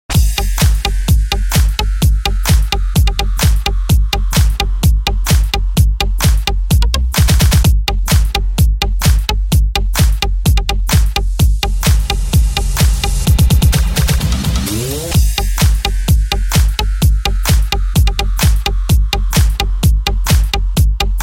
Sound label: mixing beat, ringtones electronic